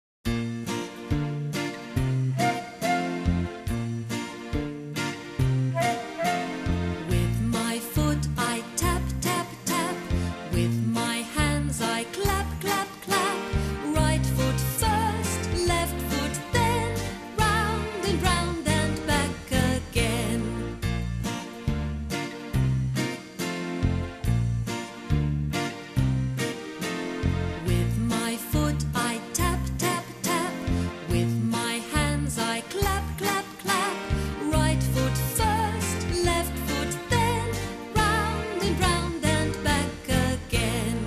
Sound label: with my foot i tap tap tap